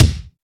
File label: clicky kick drum d sharp key, Loudest frequency: 1229Hz